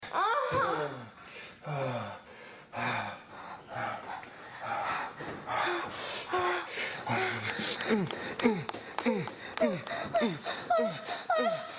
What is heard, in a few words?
moan